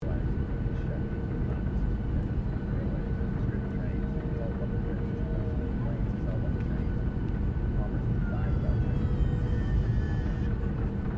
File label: AIRCRAFT BOEING 737-700 TOUCHDOWN INTERIOR; Airplanes; Vehicles